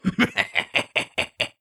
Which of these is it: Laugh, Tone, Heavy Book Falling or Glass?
Laugh